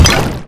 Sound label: imp bullet lightballistic bubbleshield